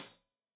Hat Tight, Hi-Hat, Cymbals; Deeply Sampled Drums

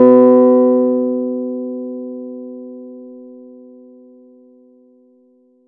Chord; ModelCycles

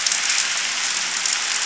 RAINDROP, RAIN; East West - Global Sounds

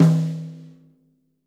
rack tom - snares on; JazzFunkKit

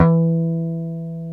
GTR, Jazz Guitar

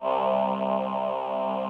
VP CHOIR, VOCODER; Greytsounds VOL-1

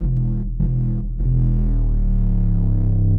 Swoop Bass, Drum N Bass

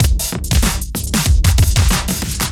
Duo Swing Mix C 95; off the grid

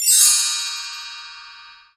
Bell Tree Down; Digital Sound Factory

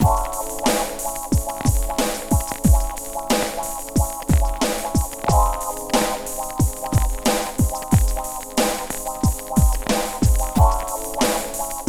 PHATT BEA

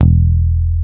Basses, Roland

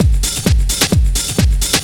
drum 130 groove; Techno Trance Essentials

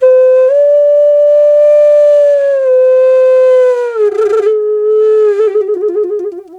D wild flute; Big Fish Audio - Didgeridoo and Other Primitive Instruments

